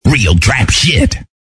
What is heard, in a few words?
real trap